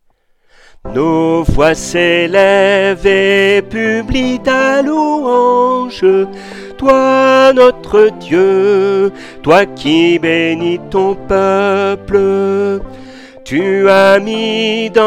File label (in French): SOPRANE